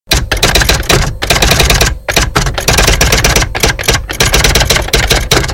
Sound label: typewriter